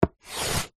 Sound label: office chalk stick thick draw line on small chalk board